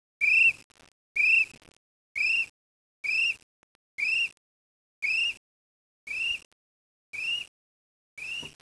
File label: kiwi